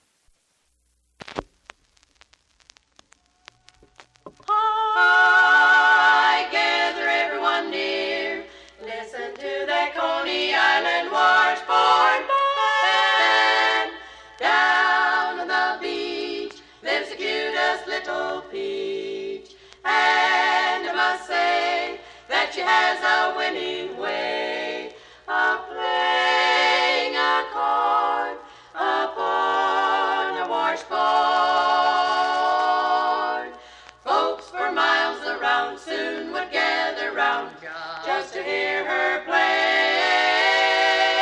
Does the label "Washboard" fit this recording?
Yes